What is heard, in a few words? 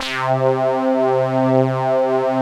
ANALOG; Pro-Rec - Synthsonic